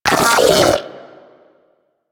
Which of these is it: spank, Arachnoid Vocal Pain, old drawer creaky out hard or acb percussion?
Arachnoid Vocal Pain